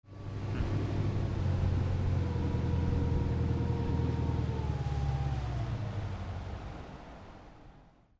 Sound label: BOATS